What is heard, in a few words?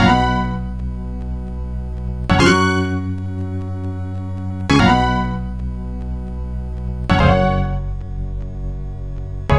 Static Organ; Sonic Foundry (Sony Creative Software); Club Joints and Street Anthems